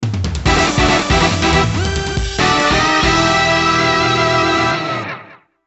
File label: Stage Clear; Character Get